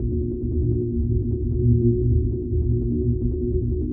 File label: alien machinery